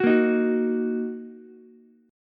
A sharp Suspended 4th Sharp 5th A sharp down Ukulele; strummed; JGuitar